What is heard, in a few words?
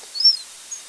mouse juv